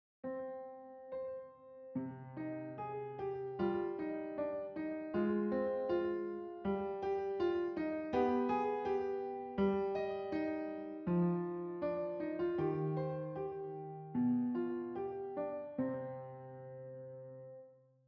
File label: Piano